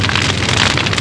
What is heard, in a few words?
fireburn, weapons